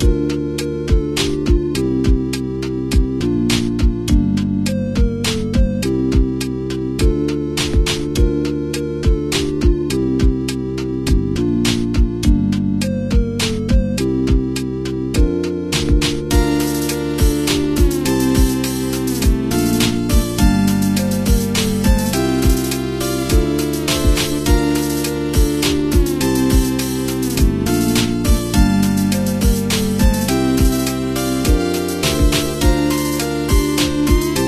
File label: game over, music